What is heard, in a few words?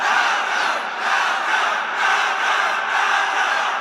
Crowd